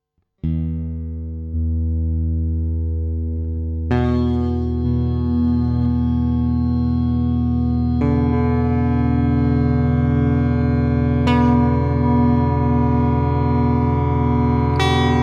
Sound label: Superego Latch Mode Wall of Sound